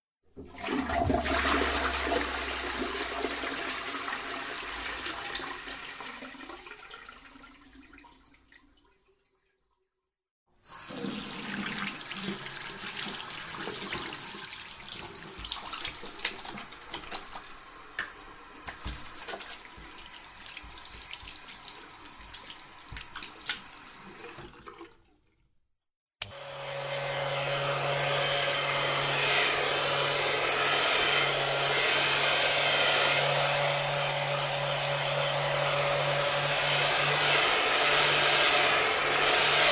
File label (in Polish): odglosy z przedszkole; szesciolatki; karty aktywnosci